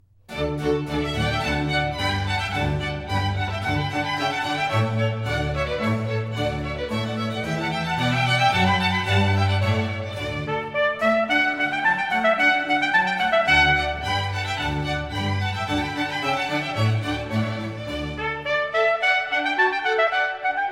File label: Trumpet Concerto In D, Allegro